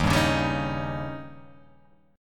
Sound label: Eb 7th; Eb7 strummed; JGuitar